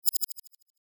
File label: button flutter bright; Multimedia; Buttons